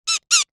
cartoon bird squawk

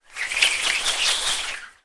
technology earphone cable spin swish through air

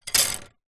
foley wood skewers handful drop on wood surface; zapsplat

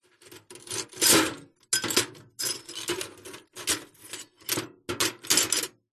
glass pieces broken rummage in metal container; zapsplat

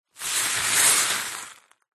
foley debris very small stones scoop up

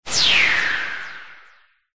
magic wand whoosh burst; zapsplat

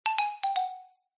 cartoon xylophone descend 2 stages; zapsplat

